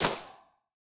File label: ball hit cold light; CrossCode; battle